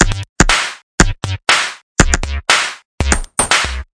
120 analog melodic synth beat

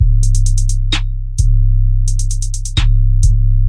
808 Bang kick hats, 103 bpm, drum loop

